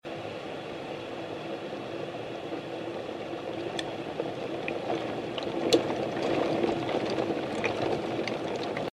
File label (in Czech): varna konvice; slysime a poslouchame